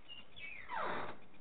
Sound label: creak low short, doors